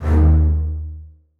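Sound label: basses staccato d#2; Sonatina Symphonic Orchestra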